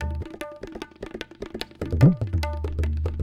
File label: Tabla; Sonic Foundry (Sony Creative Software); World Pop